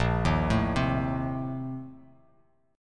A sharp Major 7th Suspended 2nd Suspended 4th, Bass Standard; arpeggiated; JGuitar